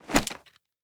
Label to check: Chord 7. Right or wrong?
Wrong